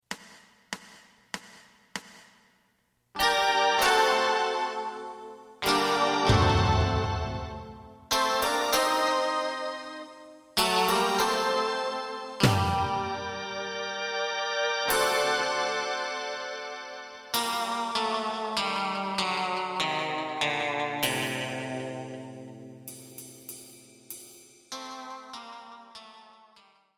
bass; 四季／夏

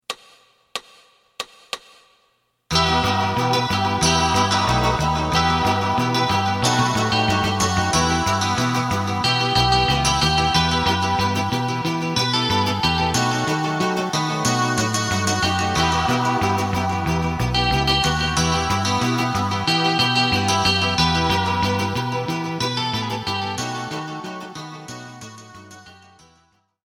drum

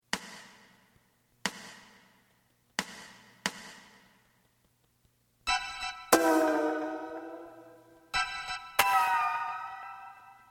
bass

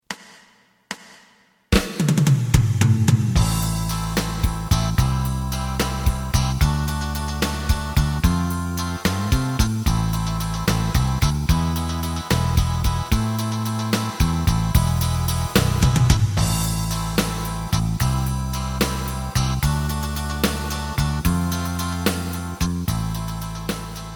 lead; 朝日のあたる家 in Seattle

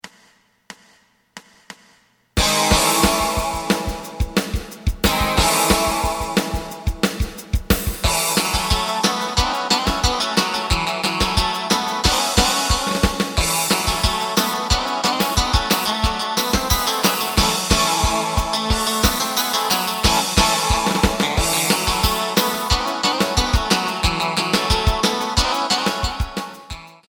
bass

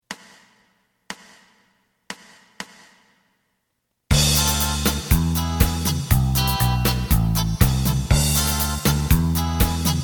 lead

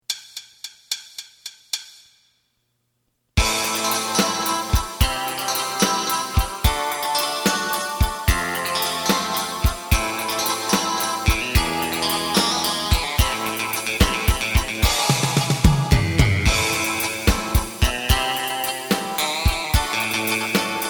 bass; エレキバンド用カラオケCD

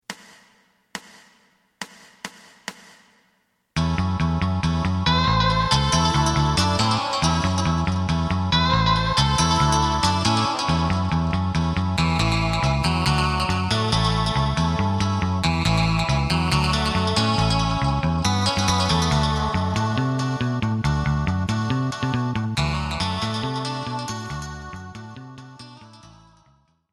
drum; エレキバンド用カラオケ

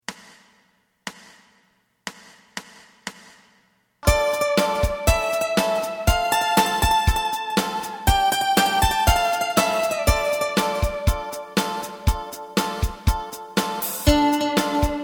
bass; エレキバンド用カラオケ

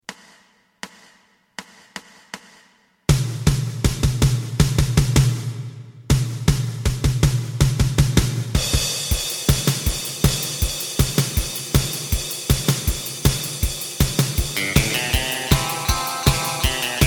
bass

